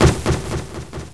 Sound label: SHOOTING; Roly-Polys no Nanakorobi Yaoki